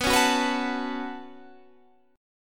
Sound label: C sharp 7th Suspended 4th Sharp 5th B